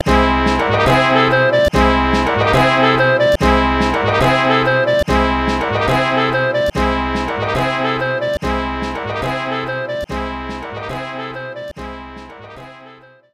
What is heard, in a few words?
Mansion Mask Intro, Music; Frog Music Streamed